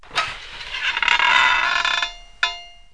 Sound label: scrn open; KABOOM! - Wild and Wacky Sound Software; house